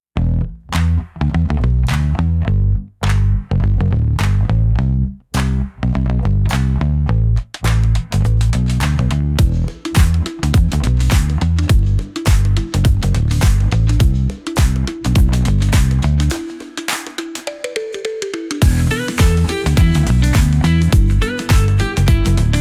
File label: Pop